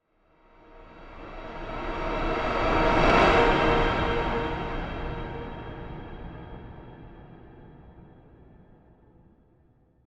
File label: reverse riser train